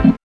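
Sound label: organ chord; X-Static Goldmine 1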